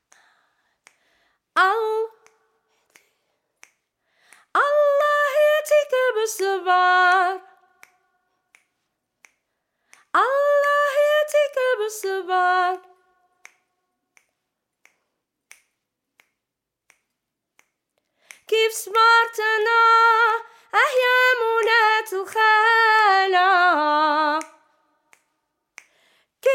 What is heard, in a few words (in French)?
CHANT GROUPE REPONSES VOIX